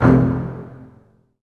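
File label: bass spiccato; Digital Sound Factory Virtuoso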